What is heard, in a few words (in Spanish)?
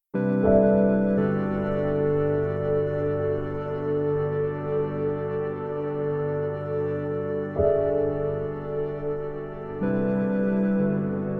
musica de piano relajante corto